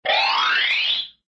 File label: xylophone; hannah barbera cartoon sound fx